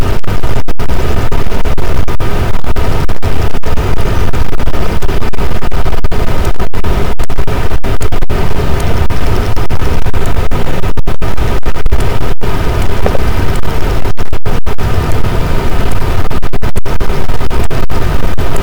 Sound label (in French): cachalot positif, boat, Physeter Macrocephalus